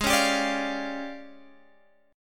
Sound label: D Minor Major 7th Double Flat 5th, G sharp